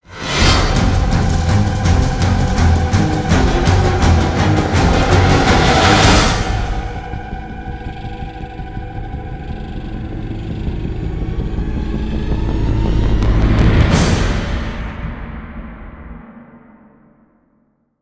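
STINGER DECAGON ENDTAG ADDITIONAL SWELL, soundtrack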